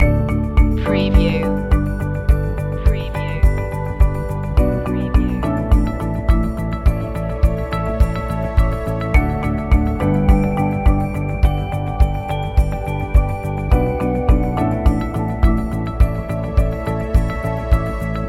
bike ride loop

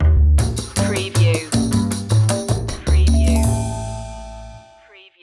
jazzy opener stinger

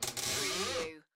Shower Door Creak; beanstalk audio

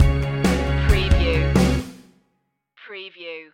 stinger

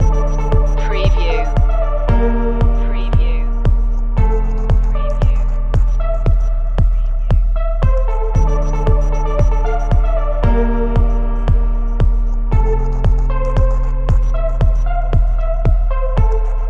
corporate awareness loop

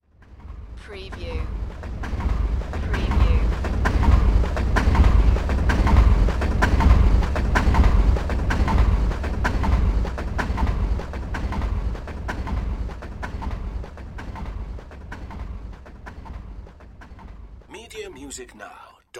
Train Passenger Train Pass By

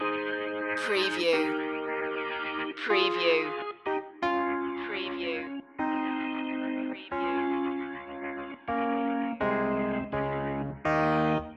a new day has begun loop

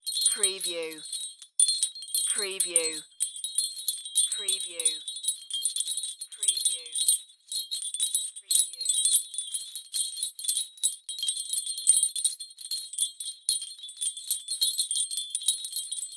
Wind Chimes Ceramic; Sound Effects Bible

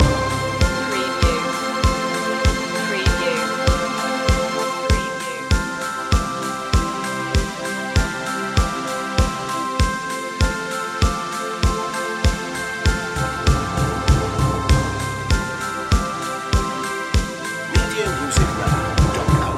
scandinavian light loop

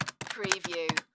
Calculator Button

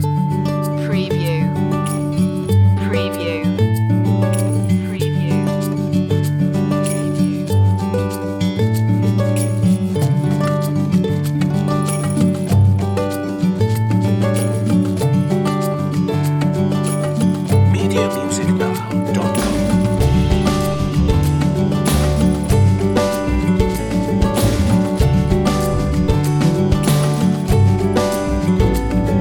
think casual

